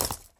taunt wrench shoulder; video games; source engine; tf

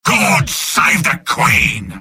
battlecry; sniper; video games; source engine; tf; mvm

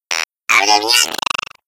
egg shrug

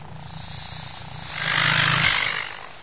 idle, antlion; Source Engine; video game; npc

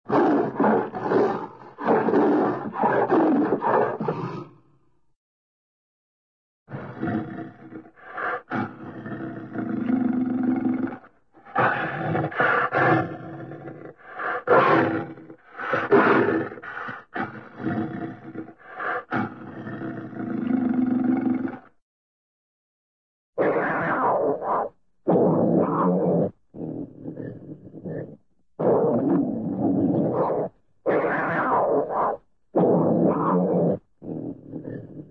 leopard growling, animal, cat; cartoon

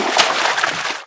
slosh footsteps, infected walk; player; source engine; left 4 dead 2

